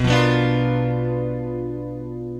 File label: GUITAR, ROCK POP; MAGIX